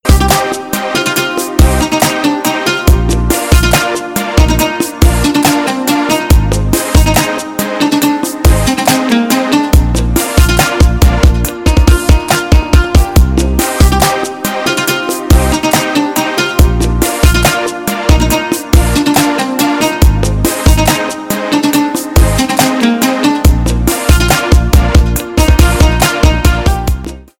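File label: krasivaya melodiya, beautiful melody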